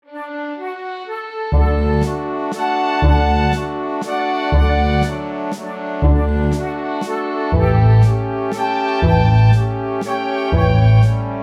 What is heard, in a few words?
bass